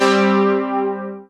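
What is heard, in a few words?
synthesizer; Best Service Gigapack 1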